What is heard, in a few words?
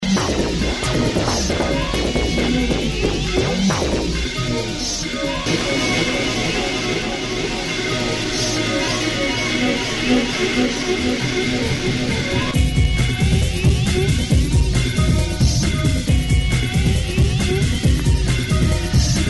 Analogue Bubble Bath